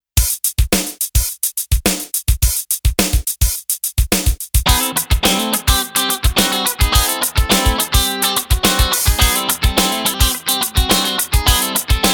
16th beat black 2x12 comp; vox

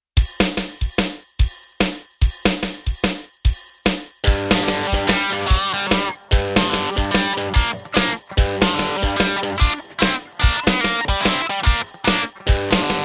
mini5 odd meter uk 80s delay comp; vox

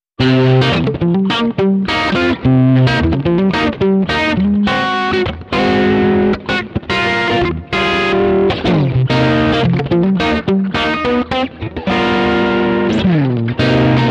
blues mode; vox

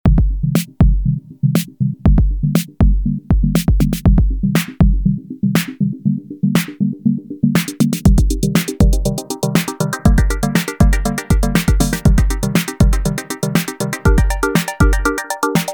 drumbrute impact; arturia

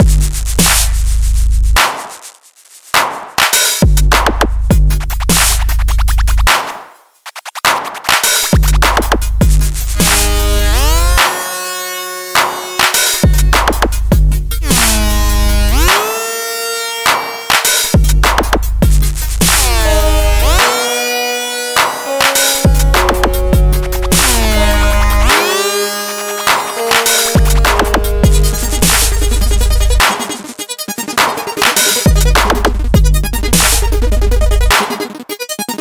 microbrute slowbeat; arturia

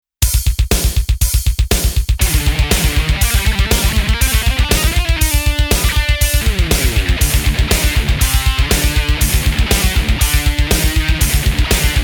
metal cali metal; vox

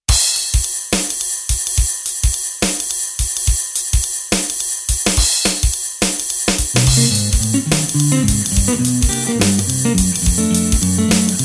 mini5 jazz clean spring; vox

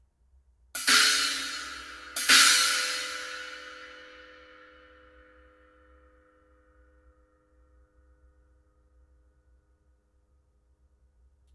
zildjian

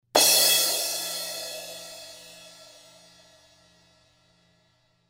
crash, zildjian

